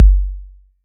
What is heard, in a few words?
BD 808; Wizoo - Platinum 24 Electronic; CHR BD 808